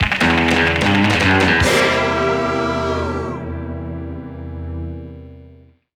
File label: Place Goal Fanfare; Deep Sea Driving - 3rd Place Goal Fanfare; crash bandicoot series sound box